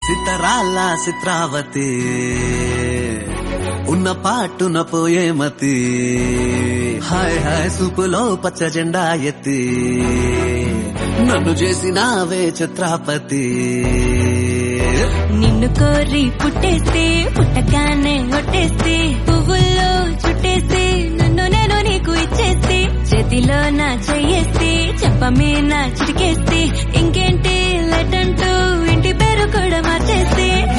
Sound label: TELUGU RINGTONE